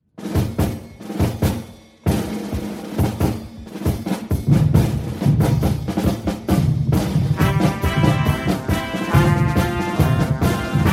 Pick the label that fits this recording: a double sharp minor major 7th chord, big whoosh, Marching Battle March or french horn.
Marching Battle March